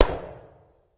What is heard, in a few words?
gunshot; Factorio; Factorio 0.14.21; fight